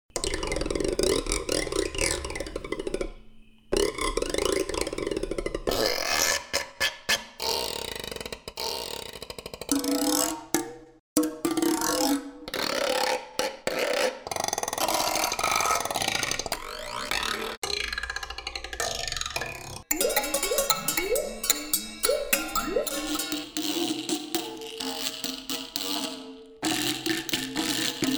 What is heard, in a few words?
Scrape